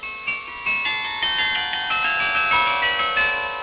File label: apeldoorn, carillons